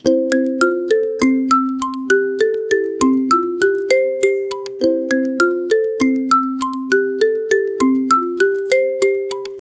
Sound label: a catchy and simple melod, ringtone